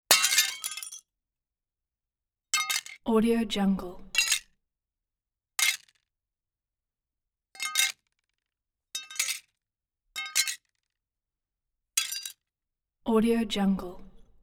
glass breaks glass breaks small; transitions and movement

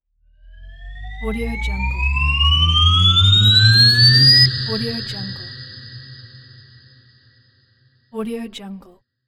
futuristic riser

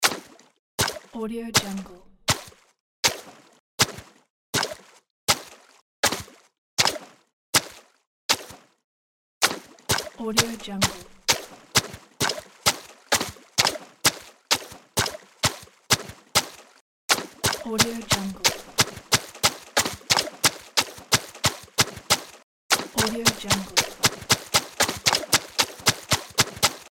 footsteps mud

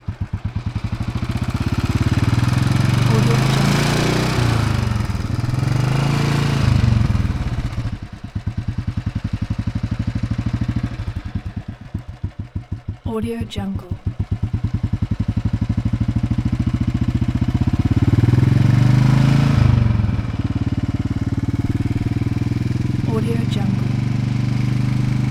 motorcycle bullet bike pass; گاز دادن موتورسیکلت

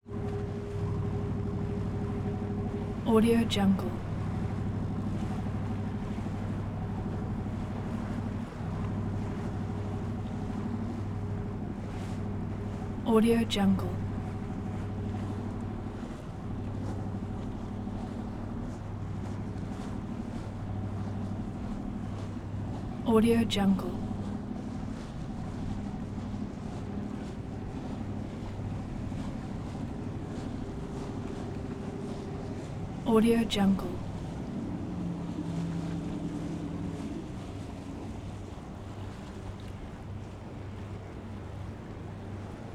chris craft twin engine fishing boat; 1977; صدای قایق ماهیگیری دو موتوره

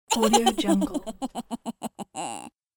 girl bad pretended laughter; human sounds

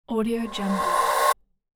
transition horror fast thin; transitions and movement; ترنزیشن ترسناک سریع

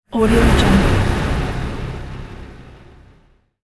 mechanism whoosh earthquake high rattle shaking; game sounds

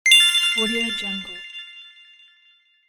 points achieved; game

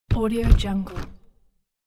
locking large window; domestic sounds

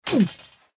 swordsman death shout short; game

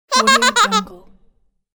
cartoon baby merry laugh; human sounds; خنده ی بچه

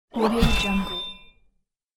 scary glass transition; transitions and movement

